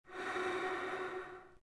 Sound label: Gasmask Exhale; Sid Meier's Civilization 4 Complete; Beyond the Sword; Units